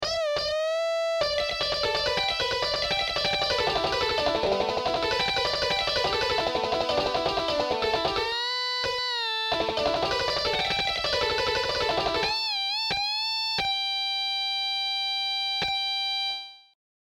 Shredding solo; Shredding Exercises

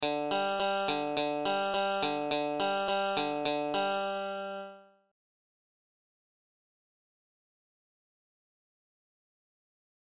Basic exercise for sweeping

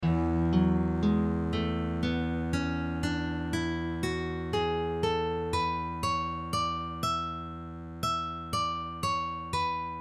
E Mixolydian; Heroes Of Might And Magic 4

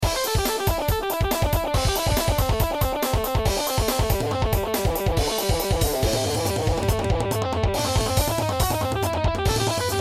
8 Bar Blues Lick; Gilbert Paul

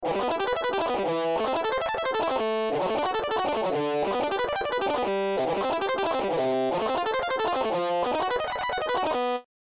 Sweep exercice another sweeping

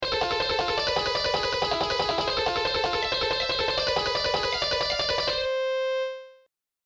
Shredding Exercise

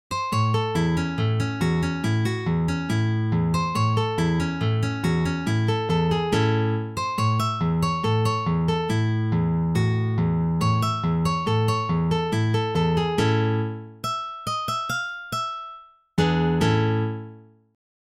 Chicken Reel; Traditional

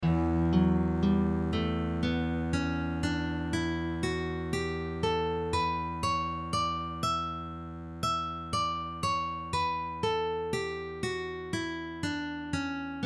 E Dorian; Scales and Arpeggios

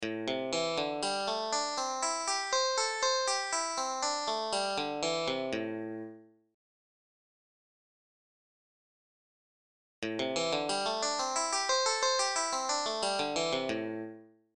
Slide exercise

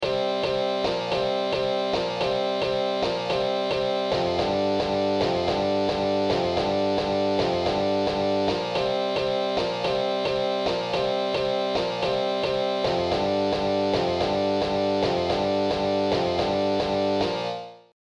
riff

